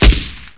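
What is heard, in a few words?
machine gun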